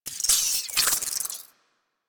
Tiny Creatures; Ambience; Warframe; Corpus Railjack

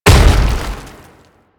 Tank Infested Step Default; Enemies; Movement; Warframe; Lasrian

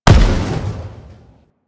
Rifle Spaceman Explosion; Warframe; Venus; Venus Vip Rifle Spaceman Agent

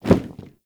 Vendor Leather Flap; Ambience; Soul Plane

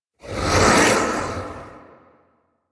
Archon Whip Ranged Attack Projectile; Warframe; The New War; Nira

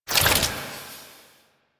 Sniper Zoom Out; DE; Warframe; Tenno; Quad Sniper

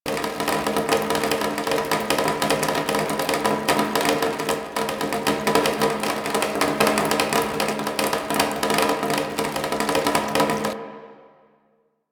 Water Dripping Plastic Chair Loop; Ambience; Props; Warframe; NineteenNinetyNine

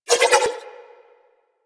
Nunchaku Spin Whoosh; Weapons; Warframe; Tenno

